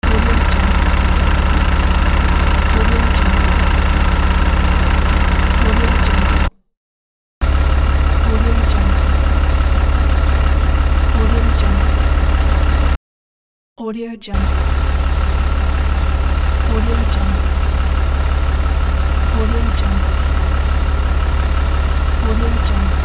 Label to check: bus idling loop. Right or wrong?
Right